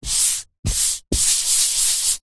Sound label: office chalkboard eraser duster rub